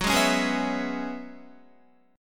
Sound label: Gb 7th Suspended 2nd Suspended 4th Gb down Guitar; Gb7sus2sus4; strummed